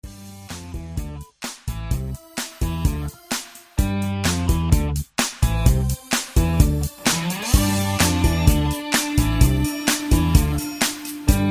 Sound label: Patter